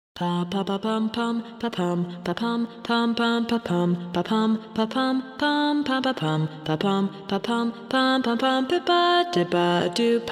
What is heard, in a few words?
Good Ol Acappella; women